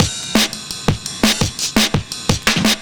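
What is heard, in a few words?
Drum and Bass Beat 170, drumnbass 170bpm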